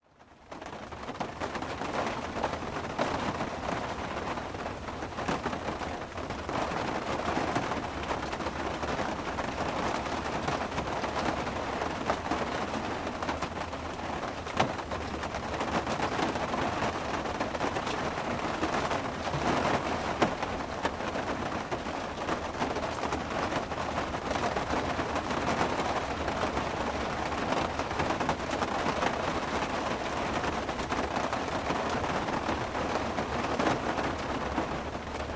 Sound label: in car park rain, rainfall